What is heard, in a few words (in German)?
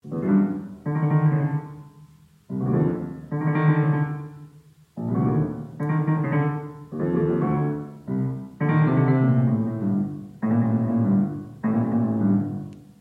Die nächtliche Heerschau modern piano; Performing Carl Loewe’s ballads